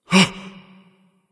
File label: surprise; smite; ares